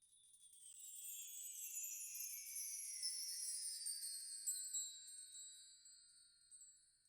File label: windchimes slow Desc, Mark Trees; Idiophones; Struck Idiophones